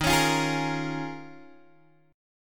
Eb 9th Suspended 4th, Guitar Open G

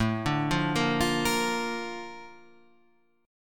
Bb Major A, chord; Bb/A arpeggiated; JGuitar

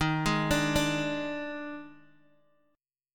D sharp Major 7th Suspended 4th, Guitar, Open D; chordshape

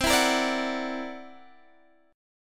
C 9th Flat 5th, Guitar DADGAD, chord

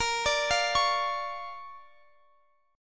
D Minor Major 7th Sharp 5th, A sharp

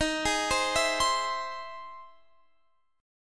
E Augmented Major 7th D sharp

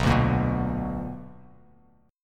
B Minor Major 7th, down, Bass Standard; strummed; JGuitar

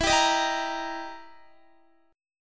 E Minor Major 7th Flat 5th E down Guitar; EmM7b5; strummed

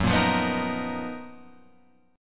Gb Major 13th Guitar Open F; GbM13 strummed; JGuitar

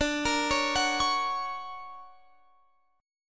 D Augmented Major 7th Guitar Open F, chord; arpeggiated; JGuitar

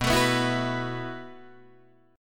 B 7th Suspended 4th B down Guitar Open D

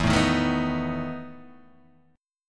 E Major 7th Suspended 4th, Guitar, Open D

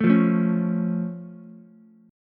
C sharp Augmented down Ukulele, chord; strummed; JGuitar

